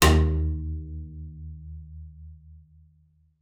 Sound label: D#2, bass pizz; Xsample VOL-5